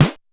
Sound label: Roland U220 Electric Set, Drum Machines